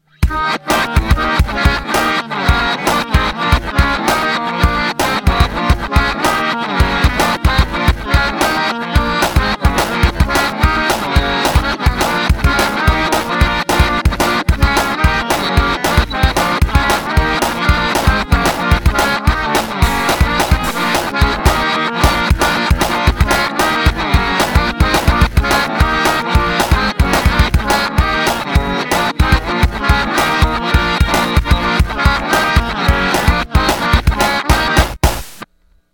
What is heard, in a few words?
Drum Jam